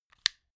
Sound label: stick lighter button light up